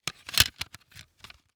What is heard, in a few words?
Technology Electronic CD Case Replace CD